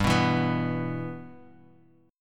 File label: G 6th; G6 strummed